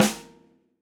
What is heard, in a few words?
snare, gretsch kit